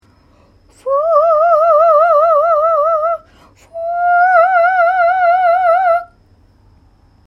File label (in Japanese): uragoe